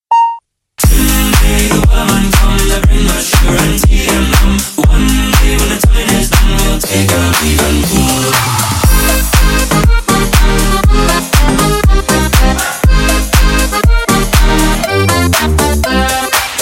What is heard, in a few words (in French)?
Musique; Défi régional 2021